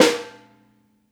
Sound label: Pork Pie Snare; Essential Percussion